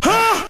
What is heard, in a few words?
huh male, Voice